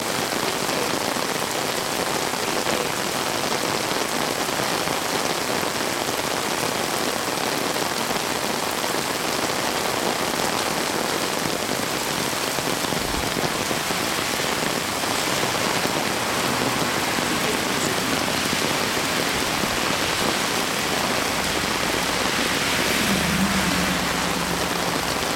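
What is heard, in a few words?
ambience rain on umbrella low traffic Kyoto Japan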